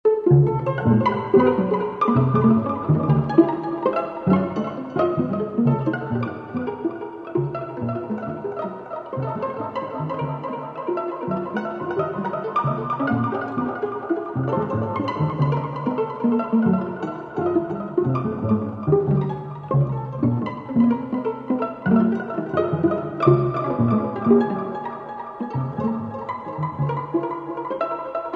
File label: creepy crawlers